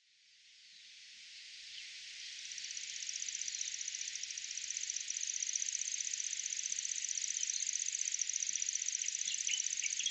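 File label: Lanceolated Warbler; sarobetsu; koe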